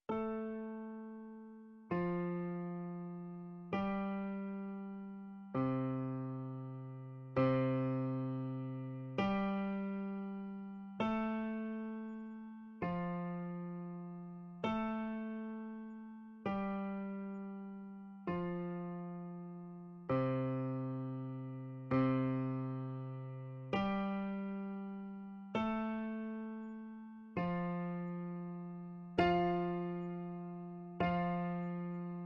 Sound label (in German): Glockenspiel; Kaluza